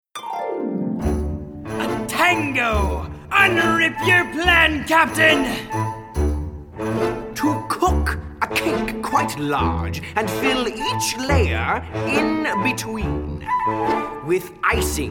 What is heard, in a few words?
tango audition cut with vocal